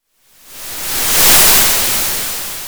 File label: Sound of waves crashing on a shore